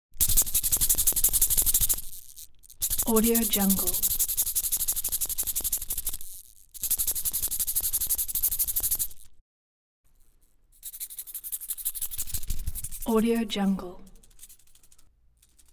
wind up scarecrow tiny plastic toy body shake

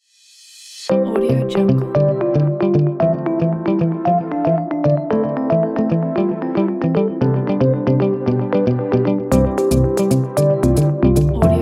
for podcast minimal

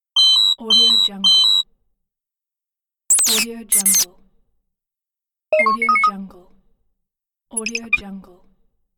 alien handheld device; audioefx

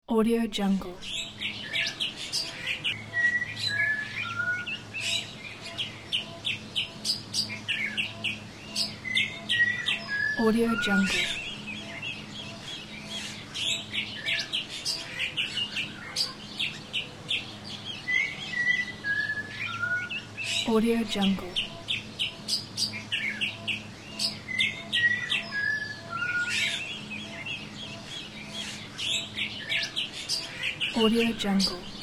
birds in jungle; vinyl sounds

